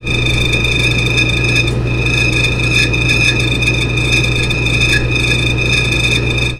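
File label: whining mechanism field recording; daily samples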